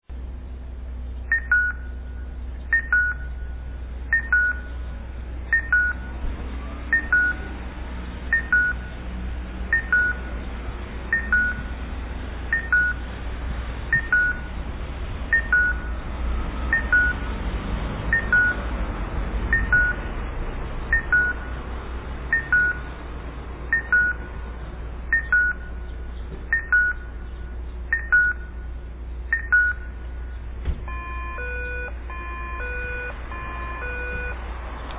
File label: carillon; kogota